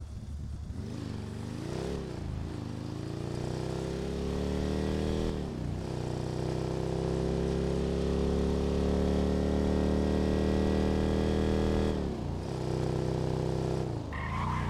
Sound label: chopper v2, vehicle sounds; engine sounds; policeb,sovereign,bagger; FiveM